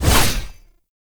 Blade Swipe; melee; weapons; Warframe; Dagath Blade And Whip

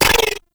Sniper Rifle Layer; Wraith; Grineer; Female; Weapons

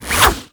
Baton Whoosh; Warframe; Lasrian Soldiers; Weapons

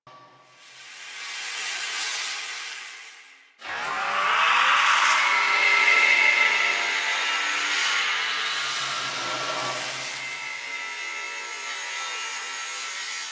Ambient Power; Warframe; Corpus; Corpus Lich; Ephemera

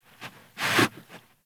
Kubrow Tame Idle Sit Sniff; Warframe

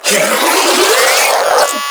Mid Spin Scream; Warframe; Proto Infested; Ancient; Abilities

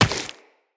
Run Rock, Footsteps; Tenno

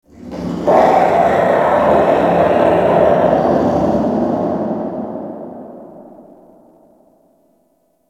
Infested Distant Creature Snarl; Warframe; Corpus Infested; Ambience

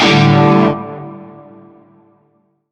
Shawzin Pent Maj Chord; Warframe; Narmer

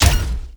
Step Small; Warframe; Corpus; Jackal Redux

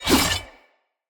Dendrit Dagger Whoosh, Swords; Weapons

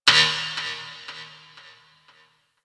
Ship Energy Knock; Warframe; Corpus; Ship Remaster

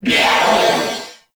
Purgatory Warrior Death; Warframe

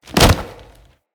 Generic Grab; Tenno

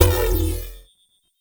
Sphere Small Power On; Ambience; Gameplay; Warframe; Entrati

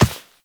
Walk Default; footsteps; Warframe; Operator

